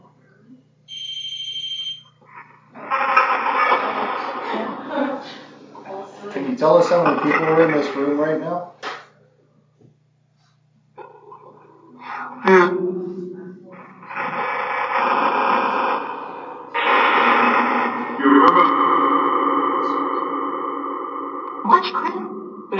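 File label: Train Station Phasma Box; Chessies Room; Mars Train Station – Return Investigation 2024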